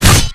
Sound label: impact; dota; abaddon